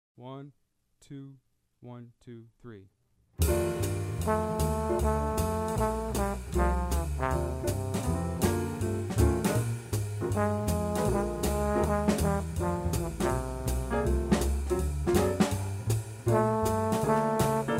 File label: Groove Blues bone